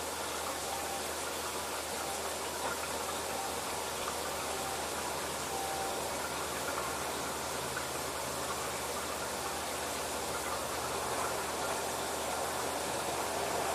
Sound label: bath siren biggest